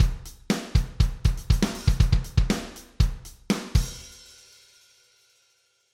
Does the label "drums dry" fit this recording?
Yes